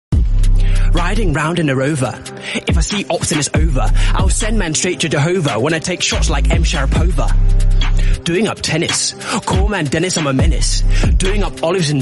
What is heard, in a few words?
british rap